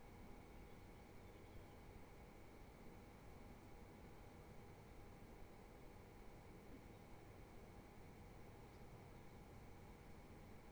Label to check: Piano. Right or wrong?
Wrong